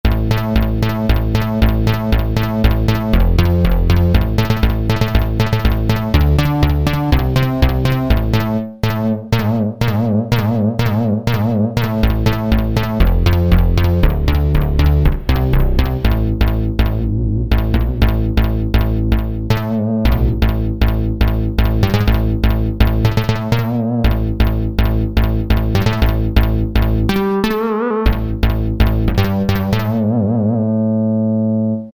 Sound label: Techno Bass, Korg MSC-3S